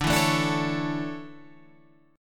D Major 7th Suspended 2nd, Guitar Half Step Down

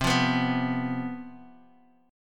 C Major 7th Suspended 4th, down, Guitar, DADGAD

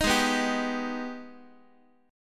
G sharp Minor Major 7th G sharp down Guitar; strummed; JGuitar

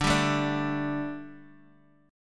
D Suspended 4th D, Guitar, Open D

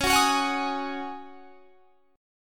Db Suspended 2nd Suspended 4th Db, chord; strummed; 21,21,23,23,21,23

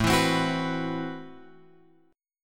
A Minor 6th Add 9th A, Chord; Am6add9 Chord {5 7 X 5 7 7} strummed

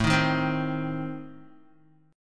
A Diminished A down Guitar; Adim strummed; JGuitar

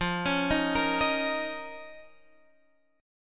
Eb 7th Suspended 2nd F

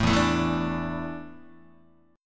E 7th Suspended 4th, Guitar; E7sus4 strummed; JGuitar

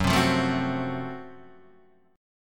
F sharp Minor Major 7th Flat 5th, guitar; F#mM7b5 Chord {2 3 3 2 1 2} strummed

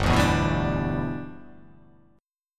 G 6th

